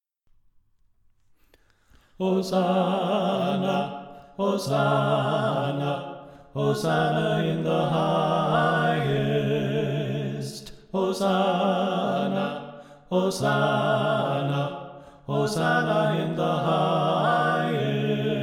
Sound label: Bass Left